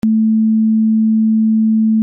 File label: drone 220; eartraining